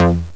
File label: FM Bass; SynBass